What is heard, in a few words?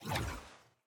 giant run cloth; smite; vamana